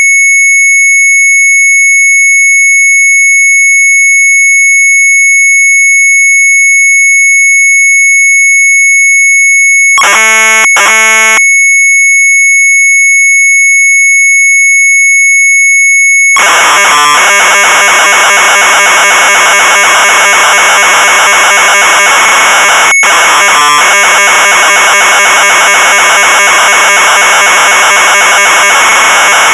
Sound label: Sharp MZ800 Tape